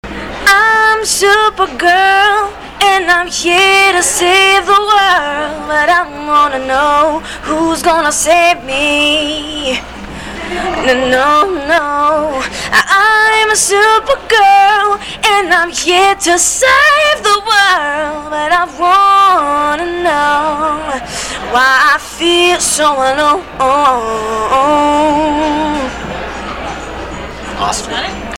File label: acapella